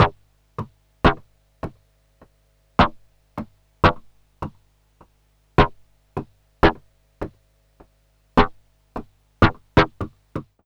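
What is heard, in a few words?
EARTH snare drum, drum loop; Big Fish Audio; Uncivilised G'rooves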